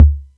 Atlantex Music Percussion Computer Kick, drum machine